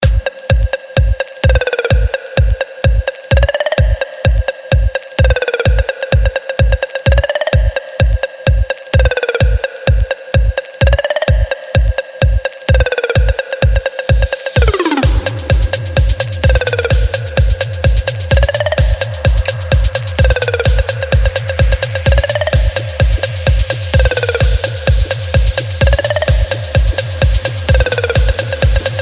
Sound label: House Sms